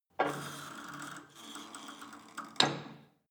cable machine old pull and drop sequence hard; sport freizeit

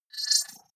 wobble swell constant short; sweeps-swells

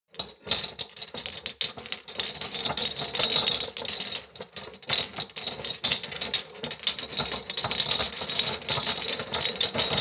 shot glasses rattle medium; berufe; gastronomie; soundpool

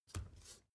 step ladder wood grip single

